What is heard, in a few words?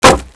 wood; Mafie 1